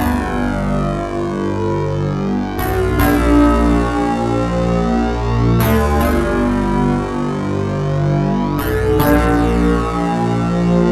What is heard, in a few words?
Bass, Synth Bass Loops